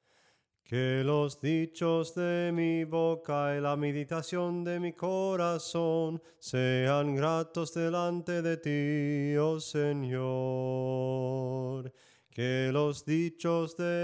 SOPRANO CANTADO; QUE LOS DICHOS DE MI BOCA

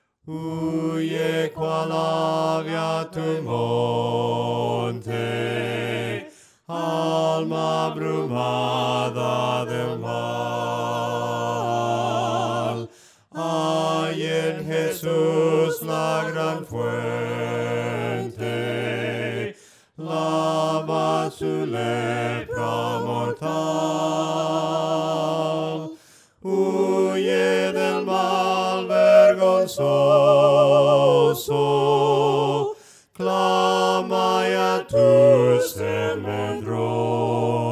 VOCES, himnos a capella; cantos del camino; HUYE CUAL AVE A TU MONTE

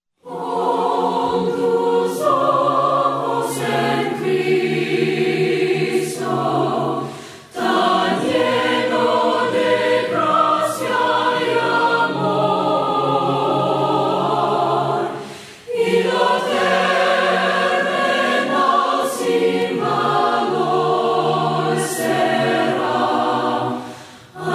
VOCES

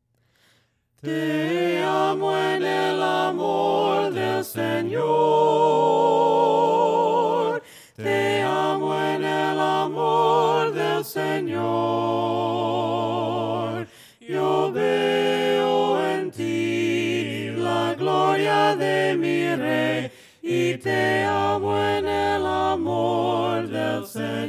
VOCES, himnos a capella; cantos del camino; TE AMO EN EL AMOR DEL SENOR

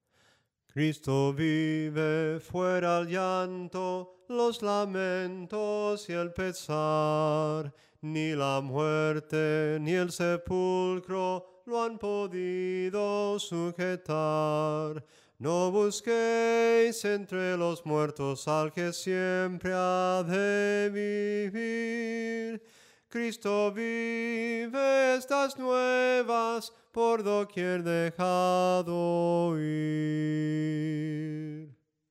SOPRANO CANTADO, himnos; ¡CRISTO VIVE

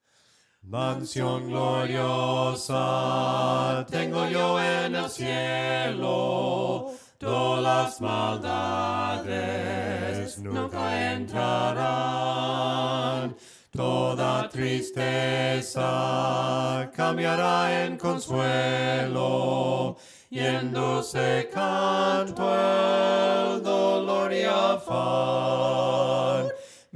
VOCES; BELLAS MANSIONES

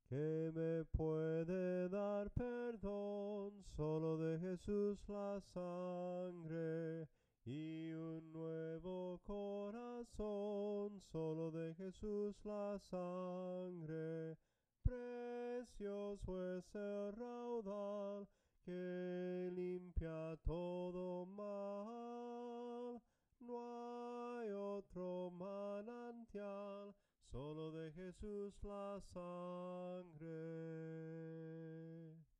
SOPRANO CANTADO, himnos en melodia soprano

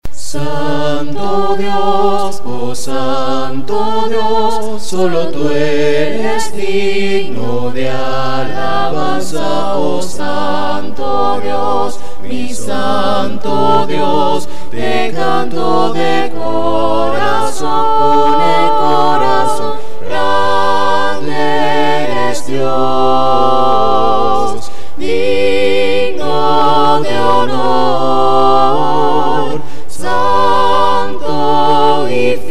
himnos a capella; cantos del camino

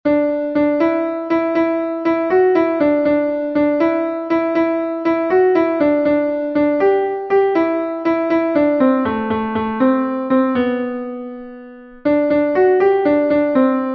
contralto, himnos en piano; cantos del camino

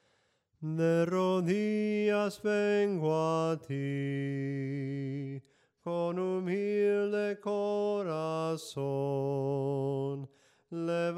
SOPRANO CANTADO; cantos del camino

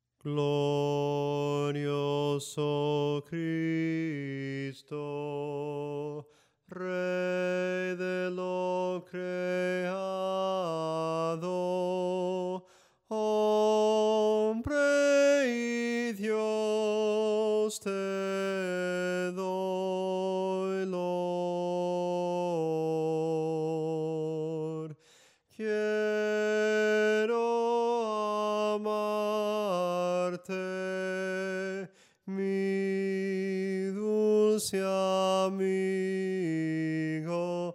SOPRANO CANTADO; cantos del camino

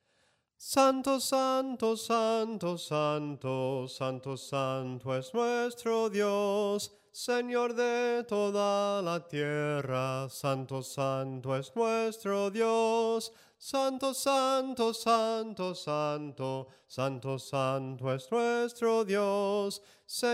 SOPRANO CANTADO, himnos

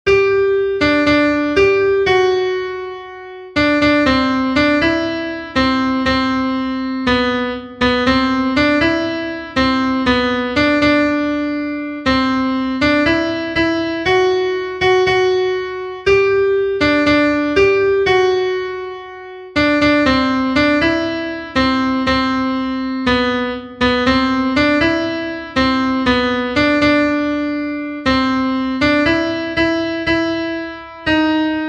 CONTRALTO, himnos en piano; cantos del camino

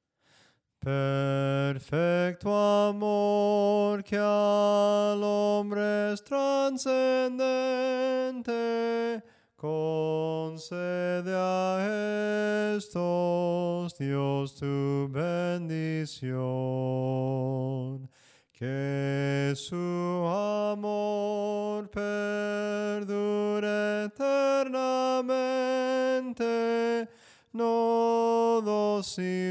SOPRANO CANTADO

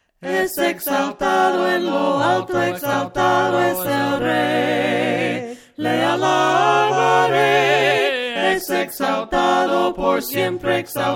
VOCES, himnos a capella; cantos del camino

